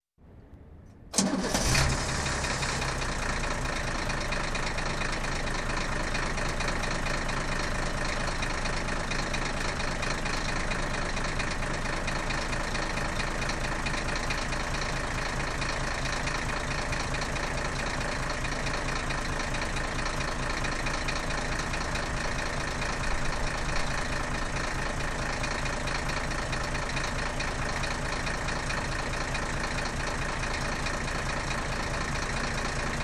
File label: Truck Engine Ignition Running idle